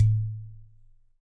Udu High

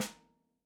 snare, gretsch kit

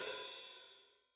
hihat open, gretsch kit

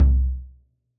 bass drum, gretsch kit

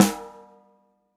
snare, gretsch kit

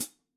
hihat foot, gretsch kit

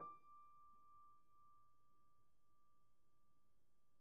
piano pp, steinway

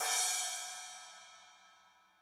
splash, gretsch kit

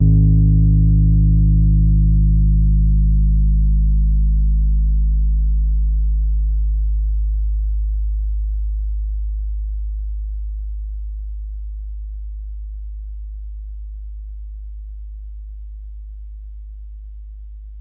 rhodes mark ii g0; fender rhodes mark II

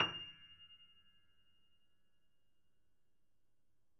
piano ff, steinway

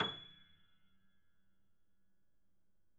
piano ff, steinway

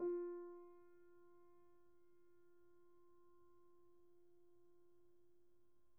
piano pp, steinway

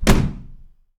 door slam

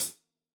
hihat closed, gretsch kit

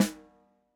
snare, gretsch kit

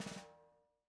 snare drag, gretsch kit

